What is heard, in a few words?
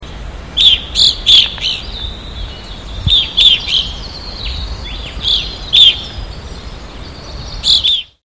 Evening Grosbeak